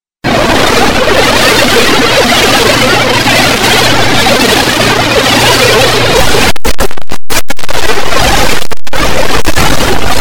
birds

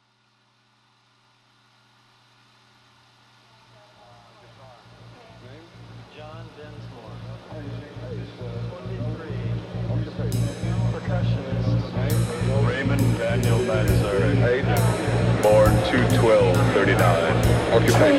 Piano bird

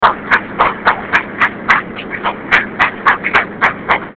rhythmus voice strange

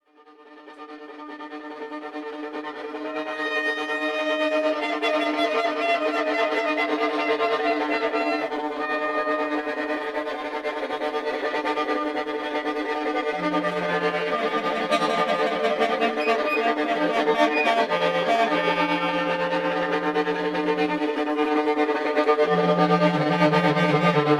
Drone; Gissinger; La Carcasse

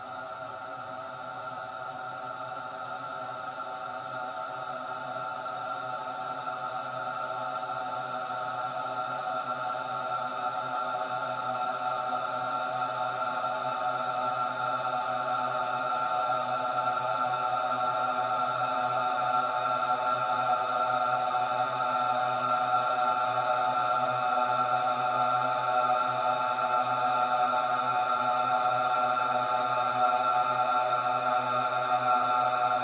Sample Tri Tones Four; SoundChaser; CerebralAudio

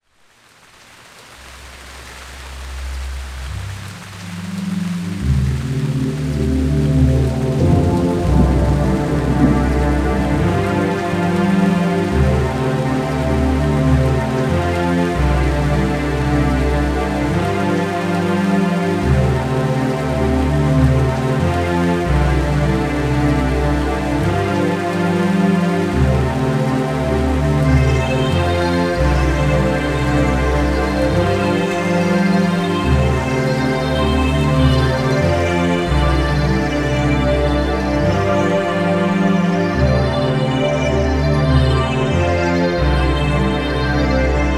(Dream Trance Mix)

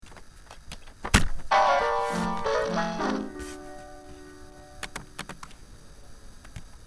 keyboard; Princess; Magic Keyboard

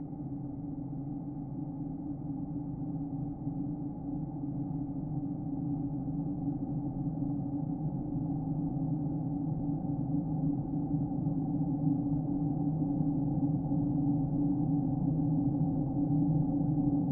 Sample Tri Tones Five; SoundChaser; CerebralAudio

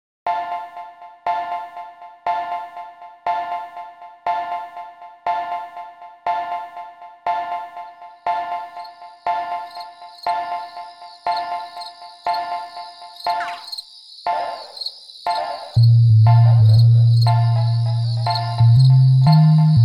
Crickets Dub; Sonik

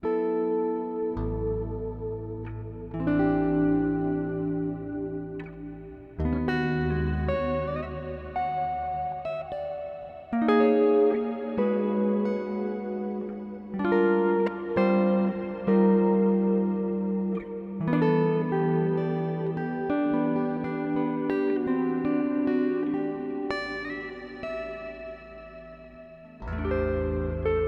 Improvisation

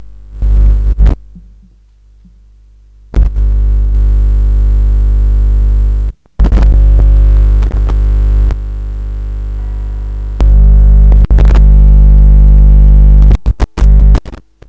Thuoom static

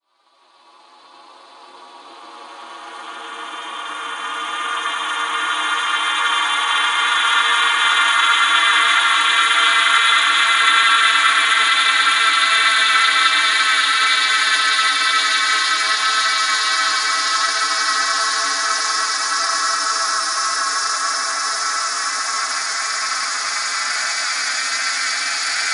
Sample Lower Shimmer Forward; SoundChaser; CerebralAudio

